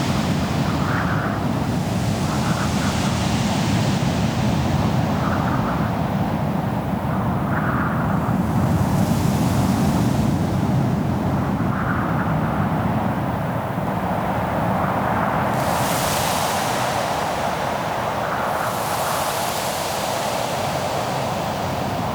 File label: Exterior Foreground Desert Loop, ambience; Duviri